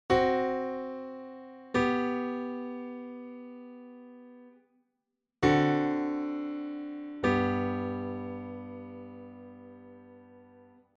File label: Tritone resolution